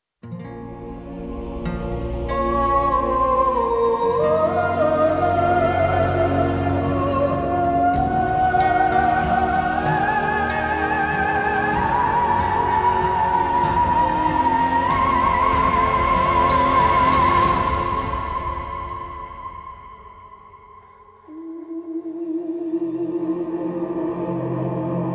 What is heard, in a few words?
Duviri Show Outro Music